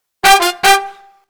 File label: BRASS LICKS, Disco Fever; Best Service ProSamples vol.48 - Disco Fever; AKAI